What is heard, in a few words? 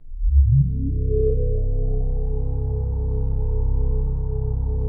KORG DW8000 C1; Big Fish Audio - First Call Vintage Keyboards